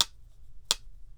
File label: Click, toolbox rummaging